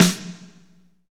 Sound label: SNR, Snares, Roland